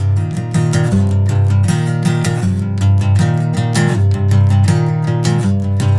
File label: Movin Acoustic, Guitars; Rock